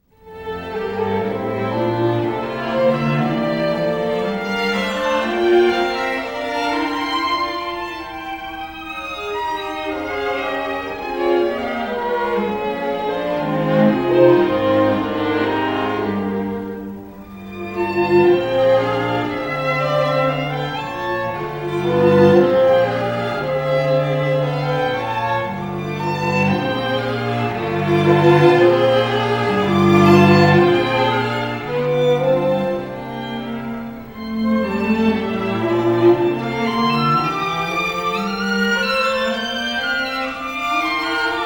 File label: Ravel string